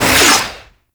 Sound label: Cannon Projectile Whistle; Pirate; Warframe